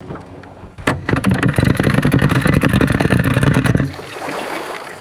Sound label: small outboard boat motor false start